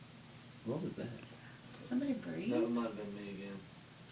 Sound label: burped ipod touch